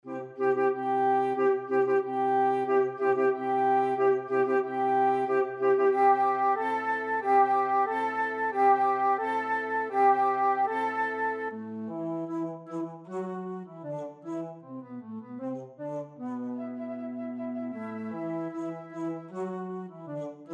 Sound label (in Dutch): fluit; Marche Royale de Lion; Carnaval des Animaux; Camille Saint-Saëns